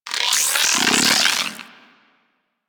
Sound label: Inf Quanta Charge B, Weapons; Warframe; Infested